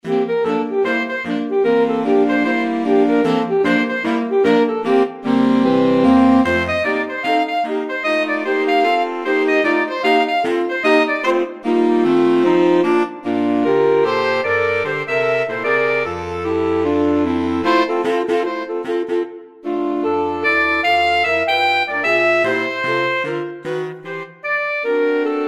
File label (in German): Sax Quartett